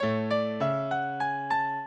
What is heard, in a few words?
minuet, mozart